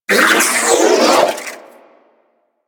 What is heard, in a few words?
Sentient Battalyst Vocal Death; Warframe; Sentients